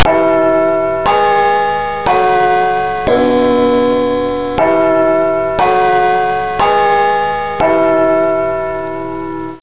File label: BIG BEN; psion cd vol1